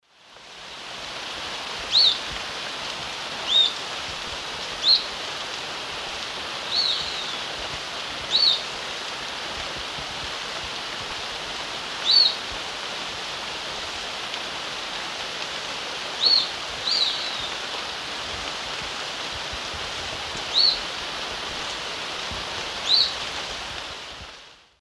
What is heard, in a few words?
Ochthornis littoralis, calls; S. America; Eastern Lowlands